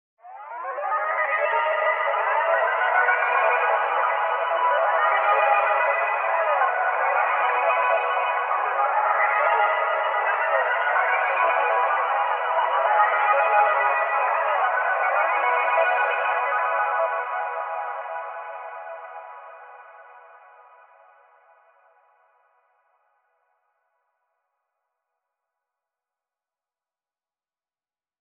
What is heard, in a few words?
Synth Pad, 120 BPM C# Minor; Cymatics; Neptune